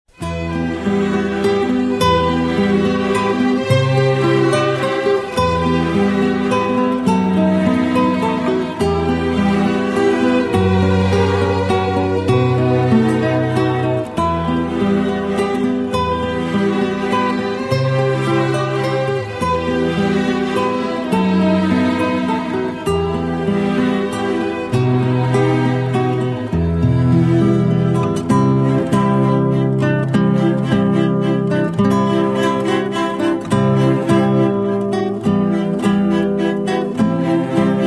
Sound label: instrumental